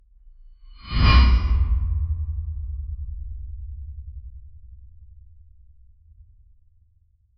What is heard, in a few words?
slow knife draw with meta; metallic scrape